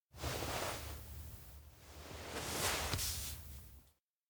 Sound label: hand reach touch cheek; awayuki; anemoi パッケージ版 1.1